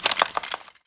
suit, footsteps

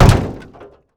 footsteps land auto; player

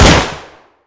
glock, weapons; Counter Strike Model Pack; CS:S

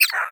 vox fall, creatures, snark

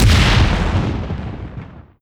shoot blast, strider, combat, warp cannon; creatures

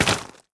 step, footsteps player debris

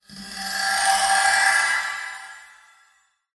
wood rattle; Half Life Alyx; Half Life Model Pack

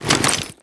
foley step, soldier; combine; soldier common

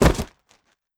cardboard swarm impact, debris

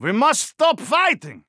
scared emote; Counter Strike; CS:GO; phoenix coop

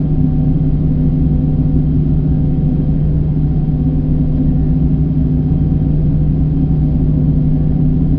wall loop, machines; Half Life Model Pack; Half Life 2

